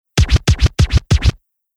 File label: dj scratch 4 times; overte; partyBall